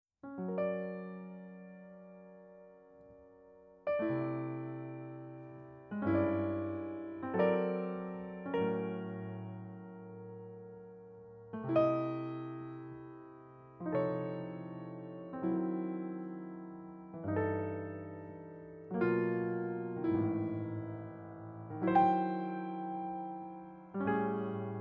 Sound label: Improvisation; piano